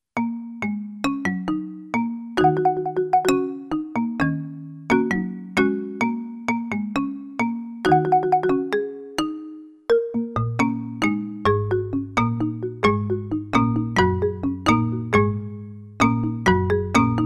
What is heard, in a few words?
Music for Marimba